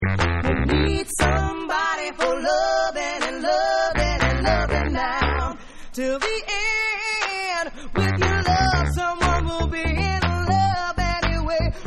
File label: Acappella